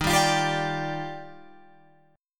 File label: Eb Major 9th, Guitar Open F; EbM9 strummed; JGuitar